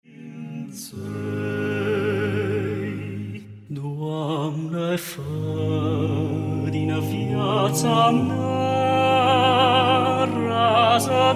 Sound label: Voice Quartet; Pe sub geamul inghetat; craciun